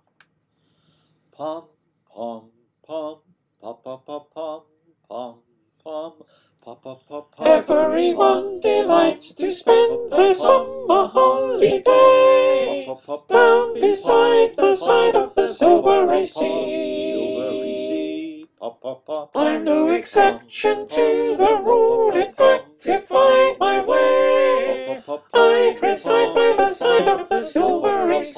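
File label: verse parts soprano, choir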